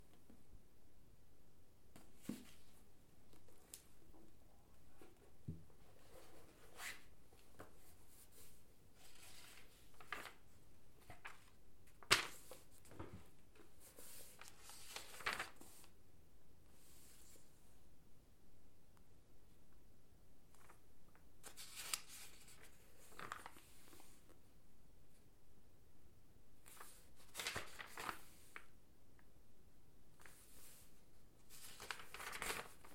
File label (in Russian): zapisani zvuki staroj sovetskoj knigi